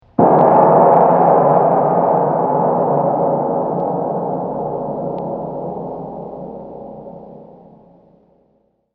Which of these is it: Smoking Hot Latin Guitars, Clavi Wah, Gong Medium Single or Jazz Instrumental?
Gong Medium Single